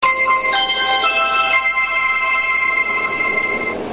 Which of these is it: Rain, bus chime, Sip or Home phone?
bus chime